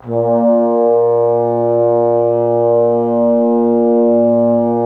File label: A#1, Trombones; Best Service ProSamples vol.51 - Classic Orchestra 2